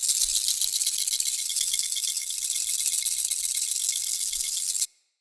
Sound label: Banglenut Close Loop